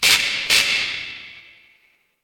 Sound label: fire electric spark